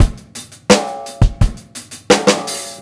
beat, Roots Funk; USB Soundscan vol.41; AKAI

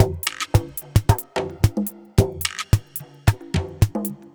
Terrestrial Hybrid, Processed Percussion

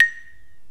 MARIMBA VIBES

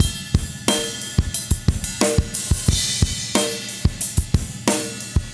rock drums bell, 90bpm; Quazibeat; Rock Heaven Drums

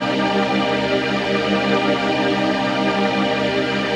PHASE PAD; Best Service; XX Large Pads

